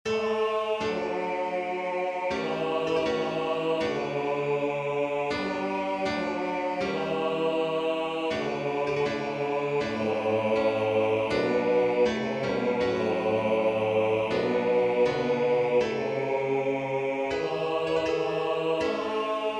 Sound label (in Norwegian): Pinsesalme B